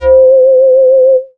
Yamaha TX81Z Whistling C5

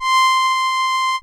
Yamaha PS-55 Violin C6; Synthesizer - Strings

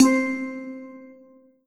Ensoniq VFX-SD Steel Drum C4

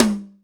Tight High Tom

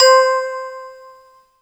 Yamaha TG100 Crystal C5

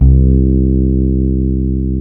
Yamaha EX5 Fretless C2

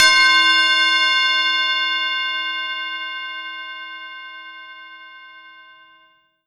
Korg TR Rack Tubular Bell C6

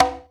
High Conga

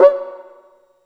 Low Cuica

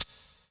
Yamaha TG100 Shaker

